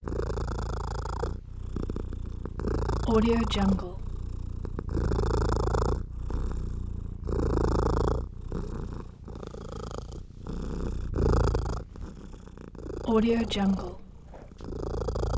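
cat purring constant close up breaths varied speed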